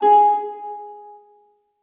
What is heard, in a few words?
melody Ab4; netent